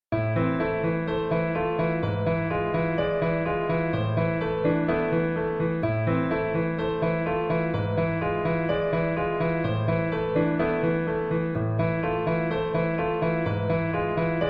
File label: accompaniment; mamma mia vocal audition materials; KEYANO THEATRE COMPANY